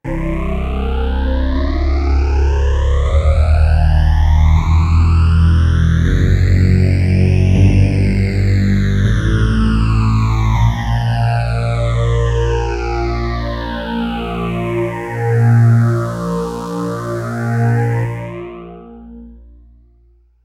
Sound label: octa scale moogerphaser